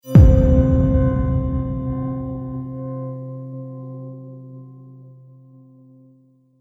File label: Haunting Chimes Trailer Hit; Trailer Sound Effects